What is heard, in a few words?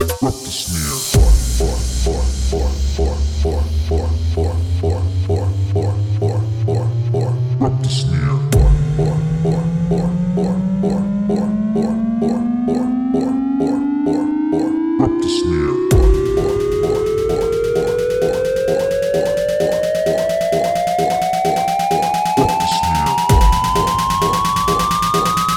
drop the snare 130 BPM